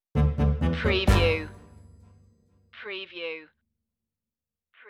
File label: quirky comedy stinger